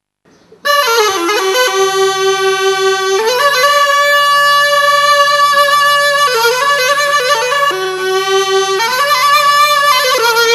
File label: jofti, ساز جفتی